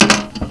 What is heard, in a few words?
mg42 close